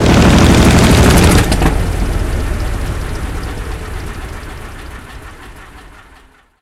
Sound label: engine stop; War Thunder